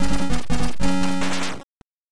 Unknown Jingle; Hudson Hawk; nes

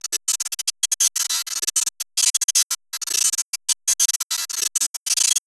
long glitched; five nights at freddy s fnaf 3

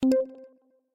Countdown; songpop party

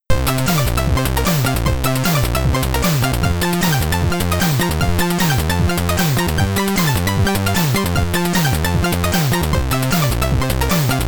Stage Clear; qix commodore 64

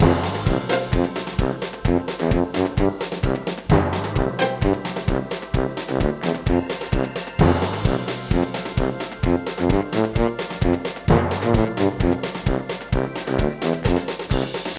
Music Party; Babysitting Mania; nds

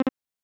Cancel

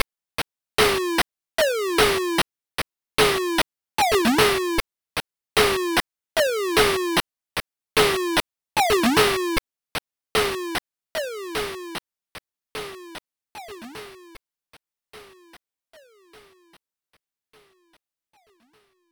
Main Music

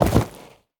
Fall Dwarf Dirt; we are the dwarves gamerip 2016

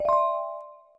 Game Patient Done

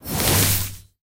Magic; mighty party 2017 gamerip pc ios android; SFX Fight Magic Green Ball

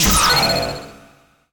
Login

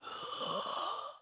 zombie chase

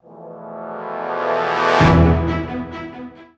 Music Stings

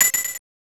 Coin; welltris arcade

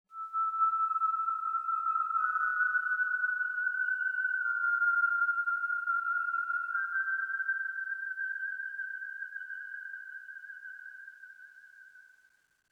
Oneshot Synth

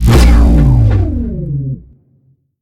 Impulse Gun; we are the dwarves gamerip 2016

